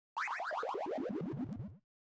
casino lose

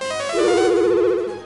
SFX Spell; Kasnooze; DQIV iOS SFX

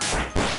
Attack Fire Claw; DQIV iOS SFX

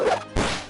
Attack, Staff of Antimagic; DQIV iOS SFX

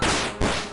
Attack Iron Claw; DQIV iOS SFX

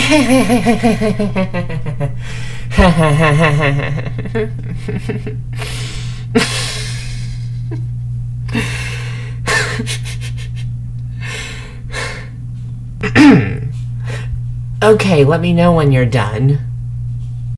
hehehehehehehehehehehehehehehehehehehehehehehehe

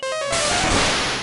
Spell - Zap; DQIV iOS SFX

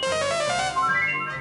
Spell Heal; DQIV iOS SFX

